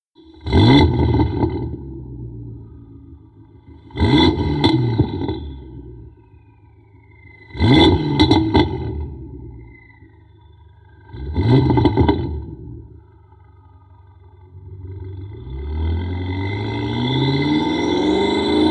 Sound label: BMW M5